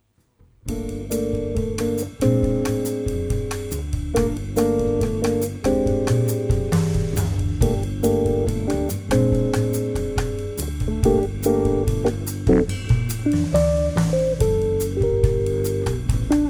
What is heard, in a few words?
Trio latin